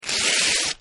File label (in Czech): trhani papiru